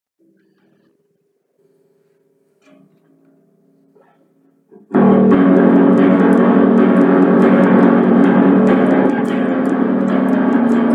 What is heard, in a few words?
ballad; Monkey Demons